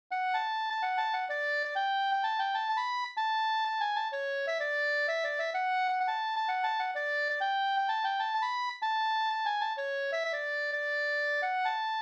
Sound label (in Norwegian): pols; Amerikaturen av Sven Nyhus no